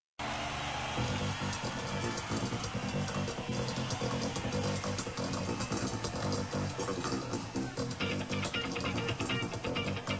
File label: jamming